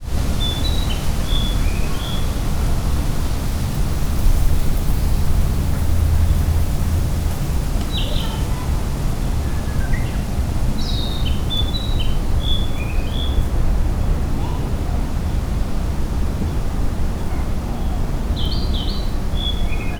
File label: bird